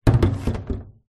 household mop bucket plastic empty place into large sink